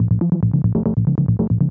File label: TECHNO SYNTH 140; Keys